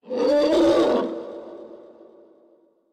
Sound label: Pig squeal; super mombo quest